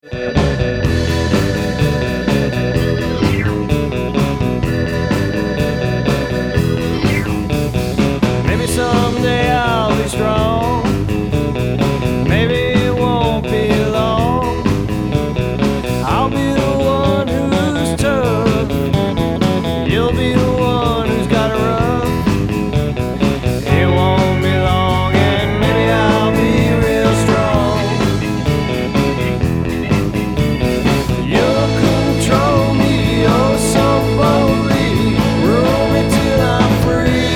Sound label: country music band